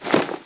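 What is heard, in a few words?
weapon select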